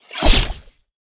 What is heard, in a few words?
impact